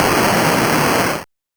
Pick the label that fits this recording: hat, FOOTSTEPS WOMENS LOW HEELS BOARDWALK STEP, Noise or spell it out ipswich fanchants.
Noise